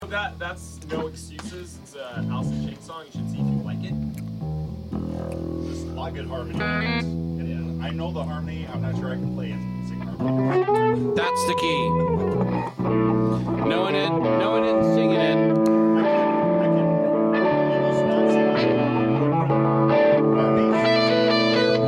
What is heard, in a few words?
Background Noise Mix